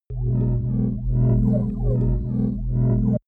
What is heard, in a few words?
biomedical stasis pod